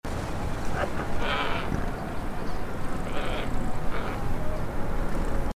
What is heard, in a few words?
glossy ibis call; chesapeakebay